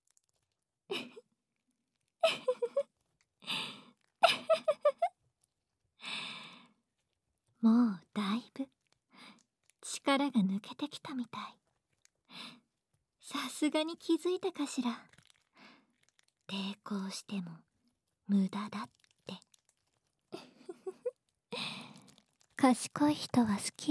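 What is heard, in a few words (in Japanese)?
ディープキス／両耳舐め／乳首舐め, ASMR; 騎乗位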